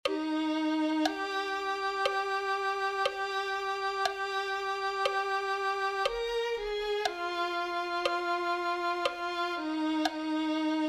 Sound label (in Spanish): purifica oh dios violin